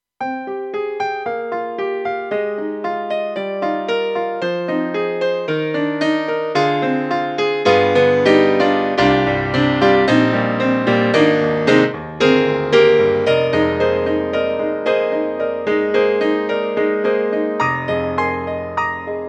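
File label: CONCERT piano; AKAI SG01p; CONSERT PF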